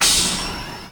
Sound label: delivery mode switch; Original TK Sounds